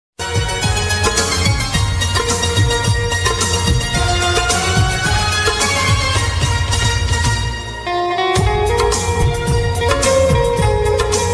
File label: hindi ringtones love ringtones sad ringtones caller tune